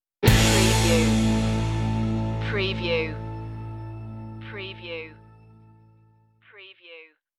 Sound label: casino funk stinger